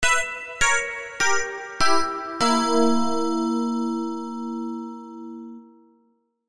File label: cartoon fail synthesized descending